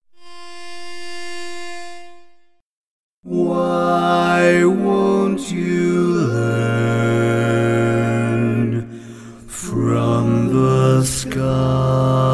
Bass, Barbershop

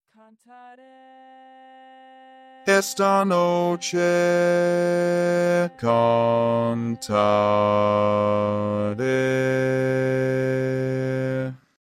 Bass, barbershop tags

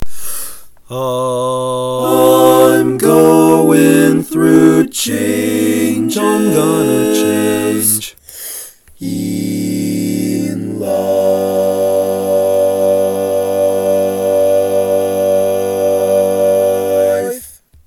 All Parts, barbershop tag

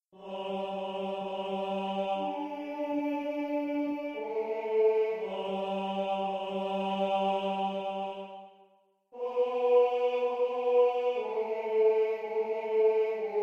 Lead, barbershop

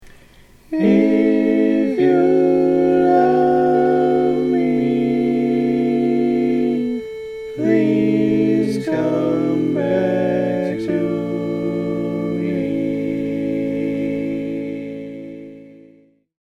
Bari, barbershop tags

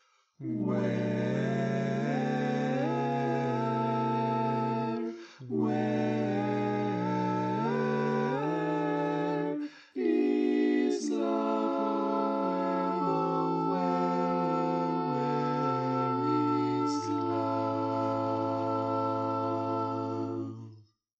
Lead

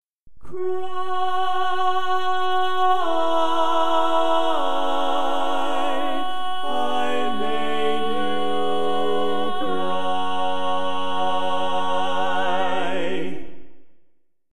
Tenor, barbershop tags

